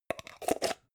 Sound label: jar plastic midsize close